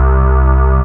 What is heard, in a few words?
BAS, Eurotech; Sounds Good